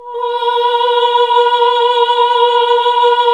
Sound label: HAL AAH, Nuns Aahhh